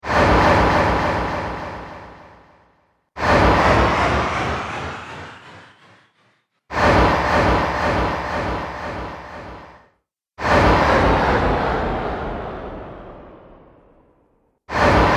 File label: Sturdy Sweeps Collection, whoosh